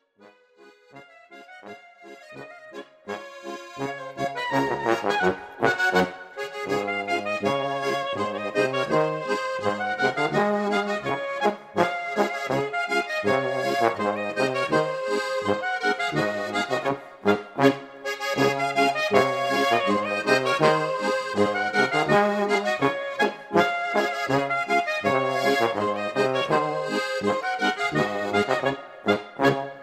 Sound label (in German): Boarischer